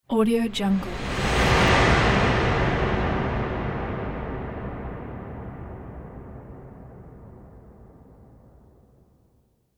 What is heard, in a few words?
epic cinematic impact; sonic-boom